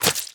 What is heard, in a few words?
Zombie Smash